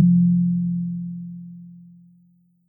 Tongue Drum LoLo

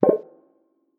Menu Select; Martian Chess

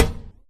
impact Plate heavy

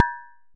impact Glass medium

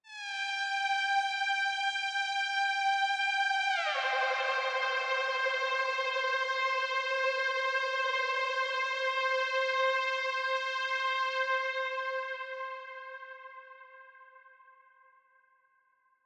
Lead Synth; Martian Chess

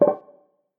Pick Up Piece; Martian Chess Audio

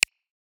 Menu Hover; Martian Chess Audio

